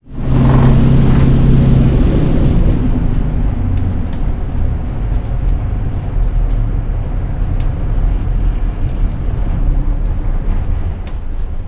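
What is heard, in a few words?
engine stop cockpit left; lancaster; War Thunder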